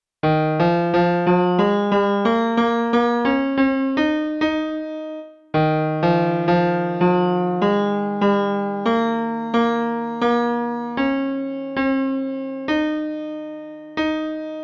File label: scale